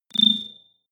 data transfer tonal, signale; soundpool

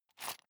sundries shake soft; berufe; handwerker; soundpool

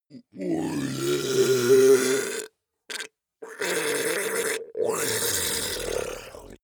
ice golem melt groan suffocate; koerpergeraeusche; comedy-cartoon; soundpool

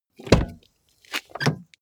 car bmw e46 exterior door front open full; Cars

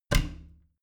bathroom cabinet both close heavy; objekte; tueren

